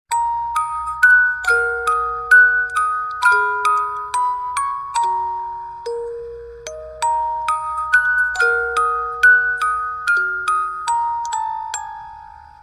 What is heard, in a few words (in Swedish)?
Speldosa Music Box